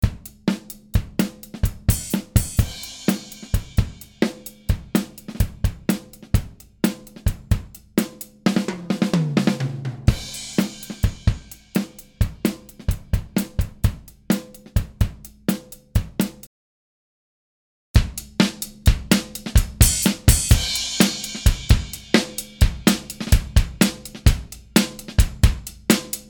Flat Drums Silver Hi Retro Console EQ Retro Mid Group Bus